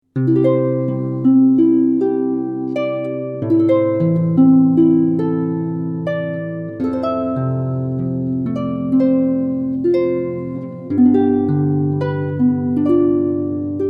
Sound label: Harp